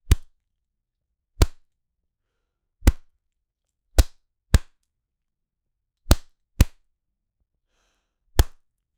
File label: a regular human punch; fight